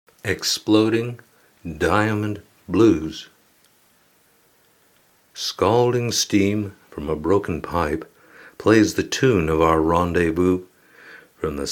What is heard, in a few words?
Blues